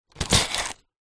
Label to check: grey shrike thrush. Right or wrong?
Wrong